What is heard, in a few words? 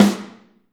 SN, Drums Percussion; CMB Kit 1